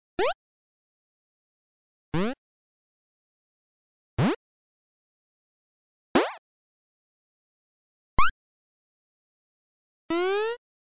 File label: 8-bit jump sound effects